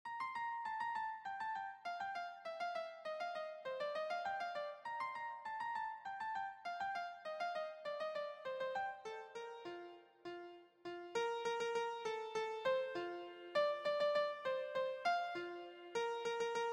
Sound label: three little maids, keyboard